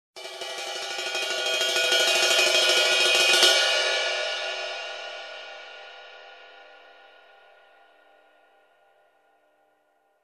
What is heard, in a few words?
Drum ride building tension